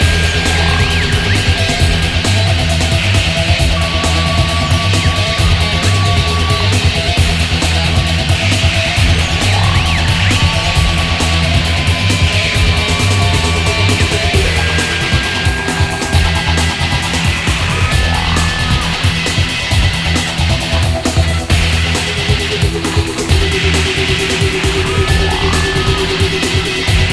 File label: fight music; brainbread; cemetary